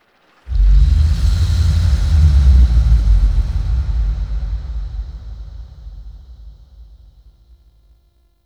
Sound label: Sentient Lair Exhale Large; Warframe